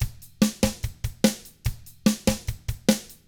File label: drums, 50s rock; ILIO; Double Platinum Drums 1